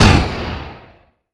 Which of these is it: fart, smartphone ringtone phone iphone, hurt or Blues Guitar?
hurt